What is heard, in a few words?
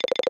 reelspin; vegas night life